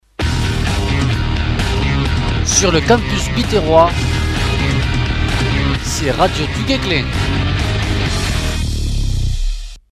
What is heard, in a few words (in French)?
jingle identif radio; Radio Duguesclin